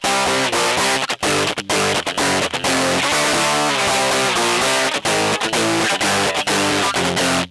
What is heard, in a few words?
grind guitar